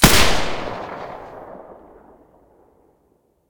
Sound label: silencer g36 mono, weapons